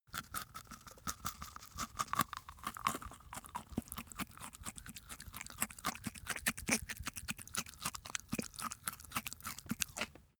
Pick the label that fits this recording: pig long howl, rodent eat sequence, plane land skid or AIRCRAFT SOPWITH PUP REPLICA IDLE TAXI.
rodent eat sequence